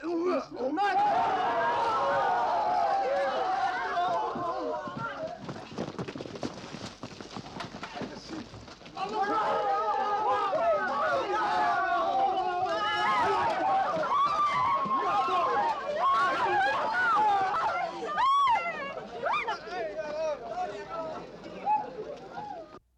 Attack On Foreign Village

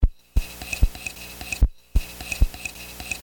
Dime

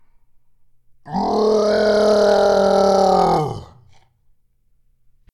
Pain Scream Serious

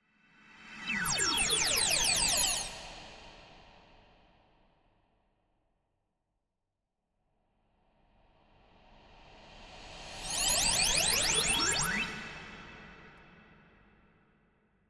Teleportation Disappearing And Appearing

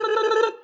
Tongue Out Raspberry

